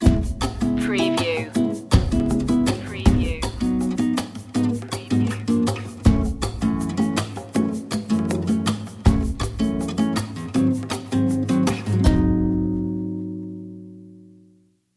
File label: vibe stinger